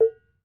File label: BONANG, Bronze Gamelan